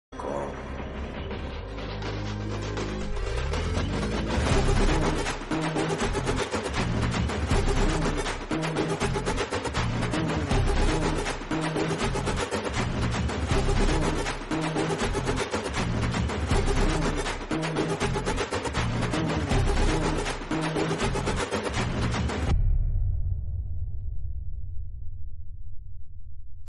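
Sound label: mass background music; pathinettam padi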